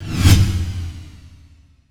Faster swoosh down; 转场类音效

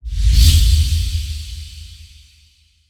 Engulf swoosh; 转场类音效

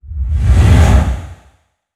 Fast dark woosh; 转场类音效

